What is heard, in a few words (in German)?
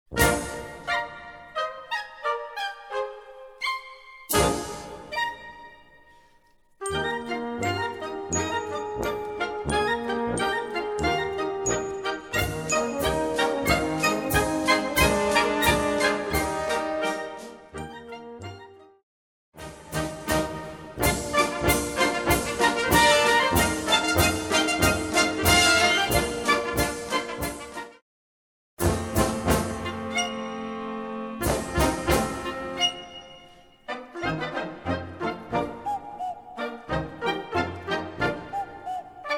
Polkas